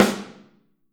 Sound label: Snare OH; Snare OH All; Drums Percussion